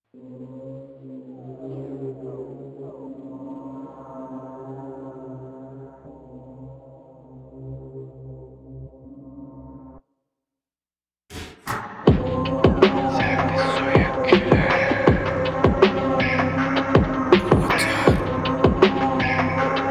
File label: Gun Shot